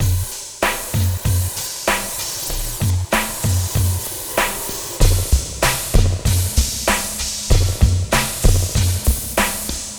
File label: Acid Mod Drumz, lounge, 096; Zero-G Kocktail Kollection; Construction Kitz